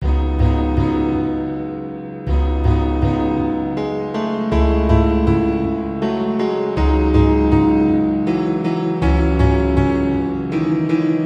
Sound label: Dungeon